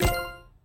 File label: tile cascade match; sweet shuffle